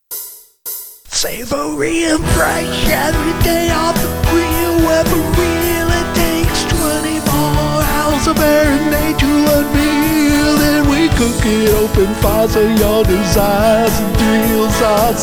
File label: music male; bibis house of kabob